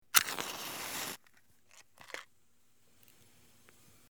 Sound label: Stick Match; Single Stick Match , Lights; Lighters